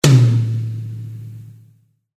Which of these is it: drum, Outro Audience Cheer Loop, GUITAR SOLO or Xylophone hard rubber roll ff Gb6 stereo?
drum